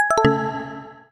notification mobile

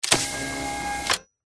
electric Door Open

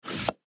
Inflating a bicycle tire; افکت صوتی

